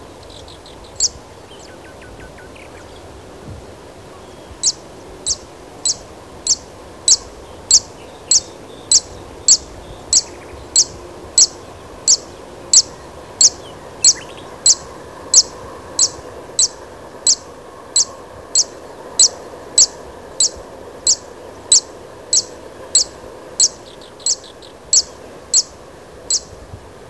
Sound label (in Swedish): Grassangare